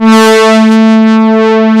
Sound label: MOOG BRAS, Moog Synths; E-MU Formula 4000 Series Vol. 3 – Analog Odyssey